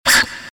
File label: short movement sound